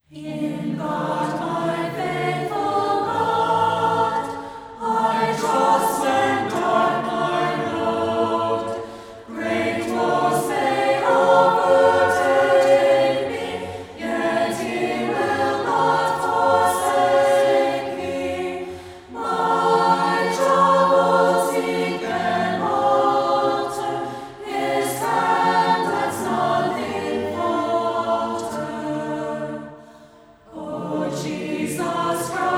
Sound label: In God My Faithful God, Choir; Schein